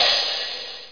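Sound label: Open hi-hat A#1; Flashback Demo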